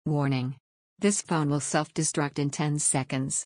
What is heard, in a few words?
Self Destruct